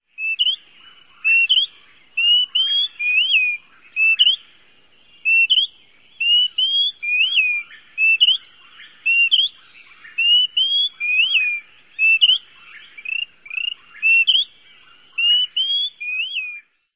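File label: Greater Pewee, dawn song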